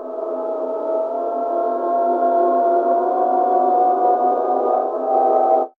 WEIRD VOX; AMG - Vince Clarke Lucky Bastard

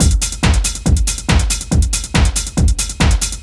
DRM, LOOPS 140, House; Best Service ProSamples vol.10 - House; AKAI

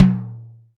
DM5 Tom M, Alesis DM5 drum machine

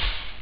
hihat; Amiga Soundtracker Sample Packs

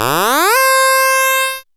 syn, Dance Synths, Acid Bubble; ProSamples vol.37; Best Service

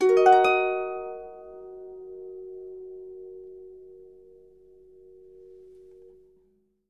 D#, Percussion & Harp; Miroslav Vitous

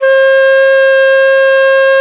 DIZI, Old World Instruments, Winds; E-MU Producer Series Vol. 7 – Old World Instruments

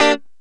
Lounge Guitar Chick 9ths C3; Lounge Guitar Chicks

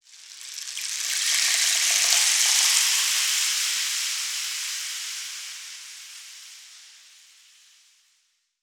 RAINSTKS, RAINSTIKS; Spectrasonics; Heart of Africa 1

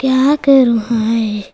CHILD, Indian Traditions; USB Soundscan vol.45; AKAI

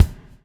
BUBBLE; Spectrasonics - Backbeat

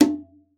CON TONE; Xsample VOL-9

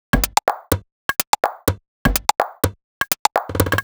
Top 125bpm, House Drumloops

